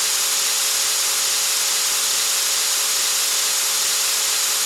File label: loop machine; sci-fi